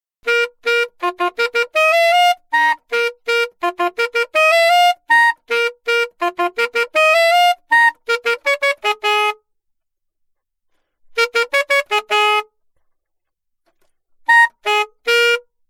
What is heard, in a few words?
altosax